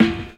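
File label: old school rap acoustic snare sound a key, tuned to the A note; Duration 0:01; Mono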